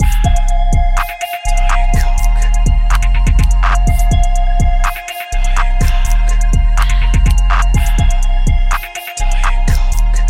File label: coke beat